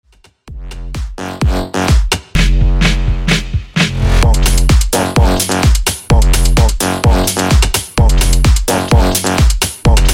Funk Bass House Remix 128 Bpm

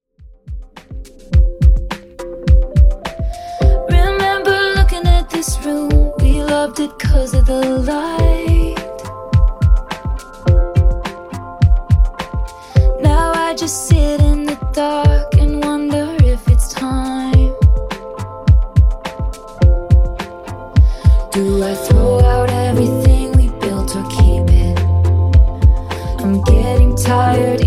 Top40 Redrum 105 bpm; remixplanet

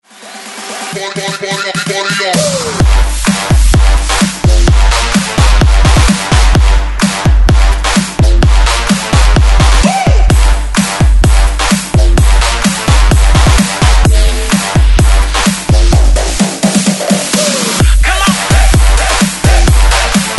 128 break mix; DJDOLLS

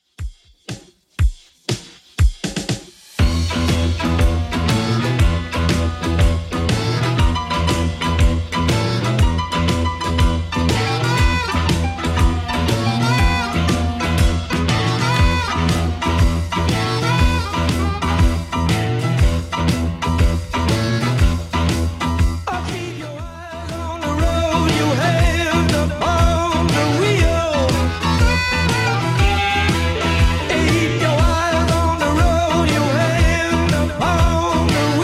70s Redrum 120bpm

